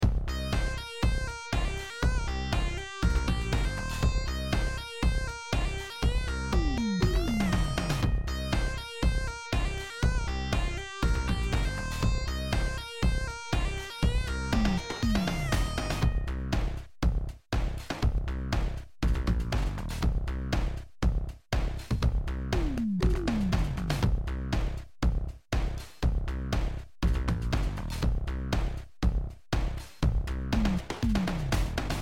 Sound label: bass basstone lead sound beat